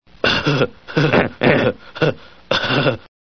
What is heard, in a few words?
funny laugh